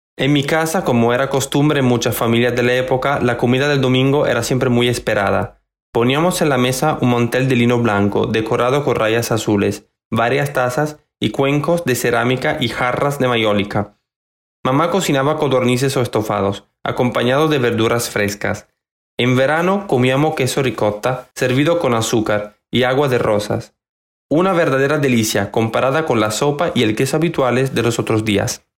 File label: piano